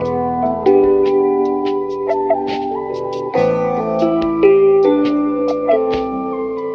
keys; Phonky

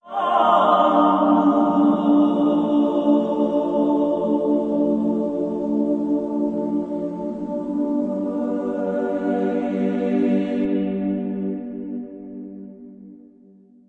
voice, Loops, Sad

